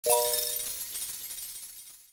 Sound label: collect; VideoPoker